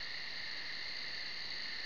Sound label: OUTSIDE; THEREALM